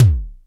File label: Kicks Electro